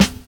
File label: DISCO SD, SNAR; USB Soundscan vol.11; AKAI